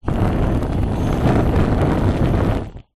household cooker oven hob gas flame blow movement wind; zapsplat